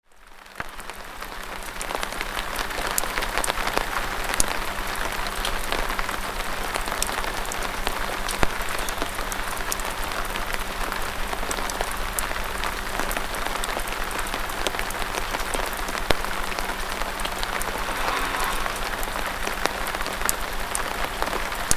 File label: rain